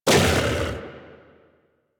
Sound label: Kubrow Armored Vocal Attack Short; Warframe